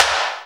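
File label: snare drum; Best Service Gigapack 2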